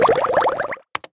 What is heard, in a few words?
Rifle Reload Water; Android iOS Switch; Milkchoco